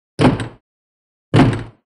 Door Being Rammed, crash slams

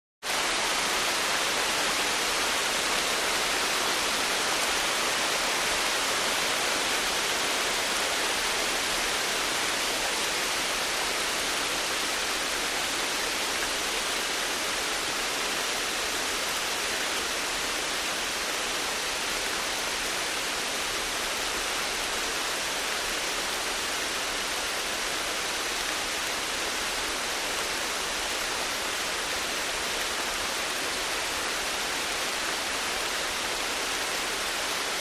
Heavy Tropical

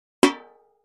Impact Metal Bucket; barrels buckets & cans

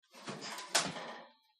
Oven Door Closes; appliances

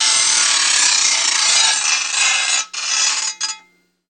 Metal Grind Wheel, grinders

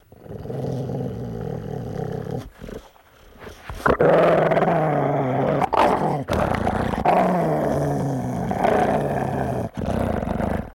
Dog Growling Angry

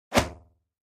Pop Up Elect Impact Re, pop up element

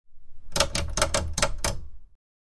Metal Dial Turn Click

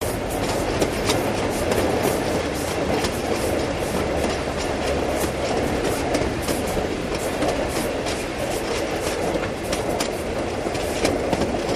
Diesel Locomotive Coasting To Stop, No Motor

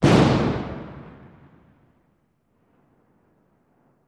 1x4 Oz Concussion

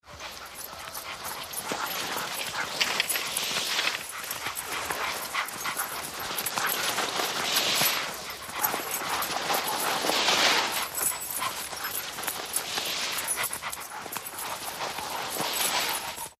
Dog Sled Stdy Run No Ba

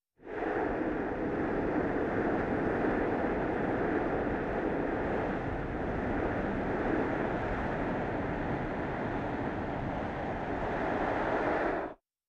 Football Flying Smo, football

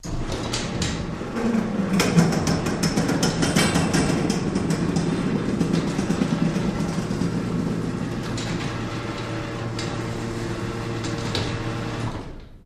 Garage Door Wooden

